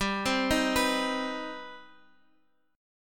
C Minor Major 7th G

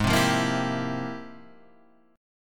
F Minor Major 11th

